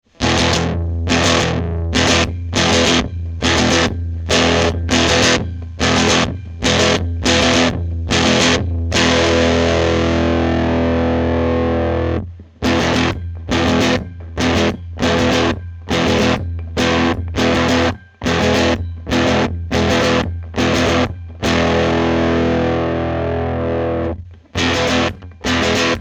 RedFox Dist Tone

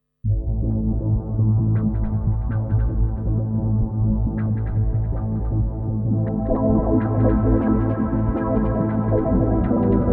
Motion Ocean

